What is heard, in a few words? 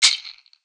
Perc